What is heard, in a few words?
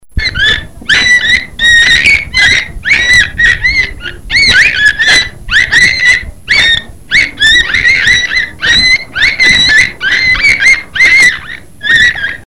beluga whale